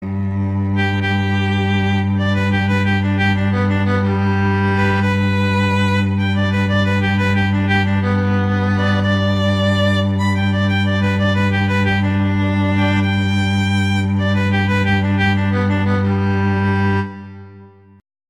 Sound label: Allegro; Corelli